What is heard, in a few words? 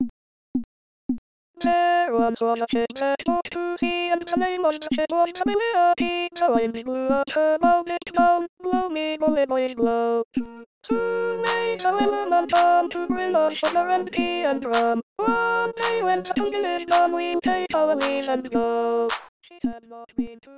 WELLERMAN SAAT Alto